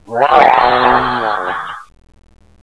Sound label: alert